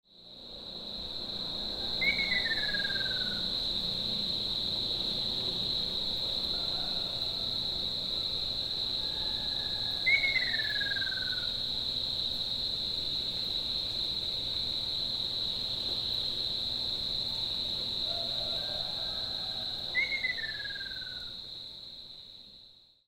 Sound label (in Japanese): akashobin, amami, koe; uemura